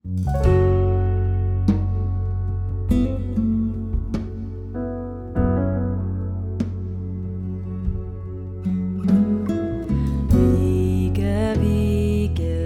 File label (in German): Wiege Welle